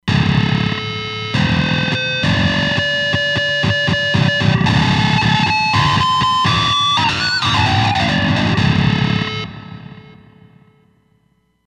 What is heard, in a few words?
Punker, Roland Fantom XR